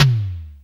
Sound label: SDS TOM LO; Back In Time Records; Big Bang